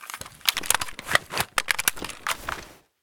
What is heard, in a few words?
galil reload, weapons